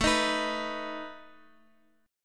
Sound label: A Suspended 2nd Flat 5th A down Guitar; Asus2b5 strummed